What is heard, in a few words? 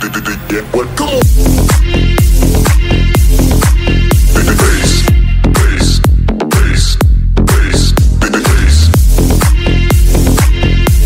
crazy ringtone, english ringtones